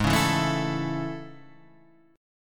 A Major G sharp, Chord

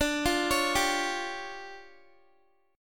D Minor Major 7th Double Flat 5th, D, Guitar

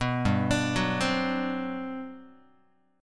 G Major 11th down Guitar; arpeggiated; JGuitar

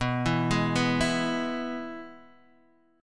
E Major B

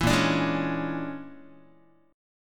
A sharp 7th Sharp 9th Flat 5th, Guitar; A#7#9b5 strummed; JGuitar

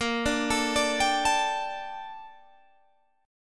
Bb Major 13th, Guitar, Open G

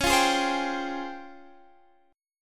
Db Major Sharp 11th Db down Guitar Drop D; DbM#11; strummed

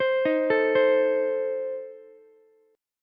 A Diminished A 17,15,17,15 down Ukulele; Adim arpeggiated; JGuitar

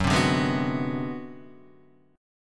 Ab 7th Sharp 9th Flat 5th Gb, chord, guitar

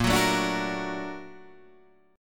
A sharp Augmented Major 7th; A#+M7 Chord {6 9 7 7 7 6} strummed

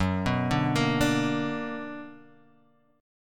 B Minor Major 7th

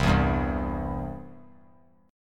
C Major; strummed; JGuitar

